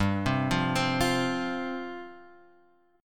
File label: F 5th G, F5/G chord; arpeggiated